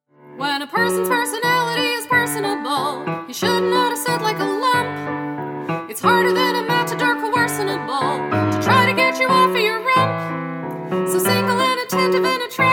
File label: Vintage Broadway SOLO